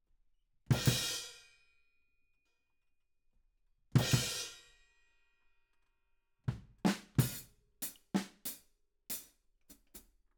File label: Ride